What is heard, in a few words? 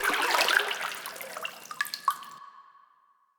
Pull Out Of Water; Player Instrument